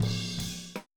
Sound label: Boogaloo Chorus Tom Rack, 82 BPM